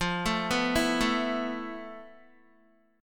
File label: F Major 7th Flat 5th Guitar; arpeggiated; JGuitar